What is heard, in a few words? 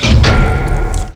click